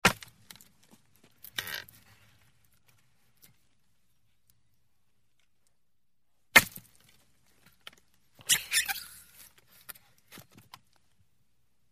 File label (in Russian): zvuk rubki drov kolka drevesini